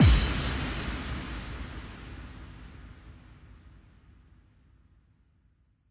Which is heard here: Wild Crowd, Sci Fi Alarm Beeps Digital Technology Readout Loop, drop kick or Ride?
drop kick